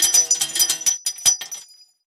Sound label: 12mm shells drop single, guns tanks; War Thunder